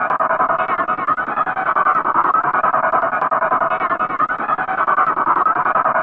159 stutter-lofi-synth, synth and electronic loops